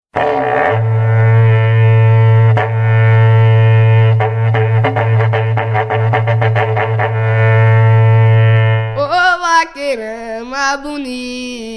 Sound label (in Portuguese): toada